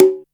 DR-660 Perc, Drums, Boss DR-660

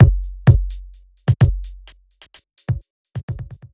BEAT, Hip-Hop & R&B; USB Soundscan vol.47; AKAI

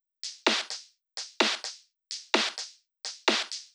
Drum Loop 128BPM Perc; Loop Elements; VEC5; Drumloops; Multi Loops

